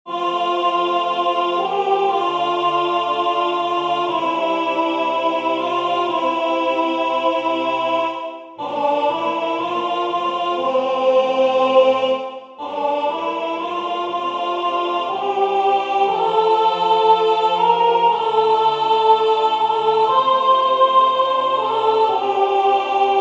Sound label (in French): Ave Maria priez pour nous soprane